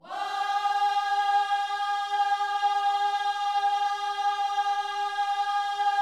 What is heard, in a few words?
WHOA G#4, gospel; Spectrasonics Vocal Planet